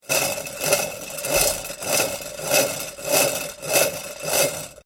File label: egg beater